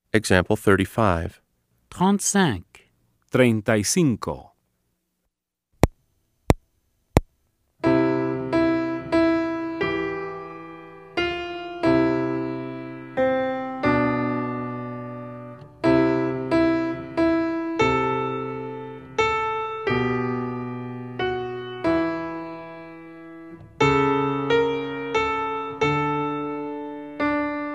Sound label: Keyboard